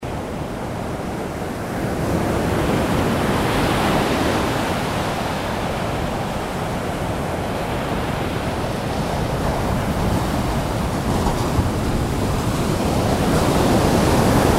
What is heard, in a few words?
california coast